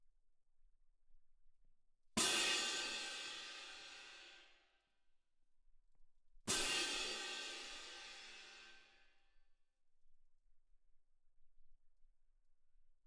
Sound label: Perc